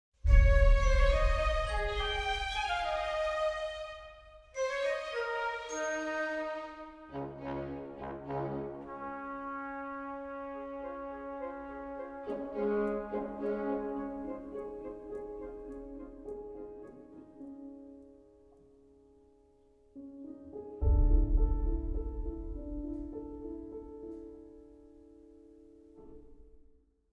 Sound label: World Music